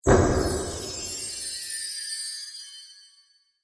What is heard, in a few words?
Magic Explosion; Magic Sound Effects